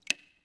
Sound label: drip; school; Tony Hawk's Project 8; XBOX; inschool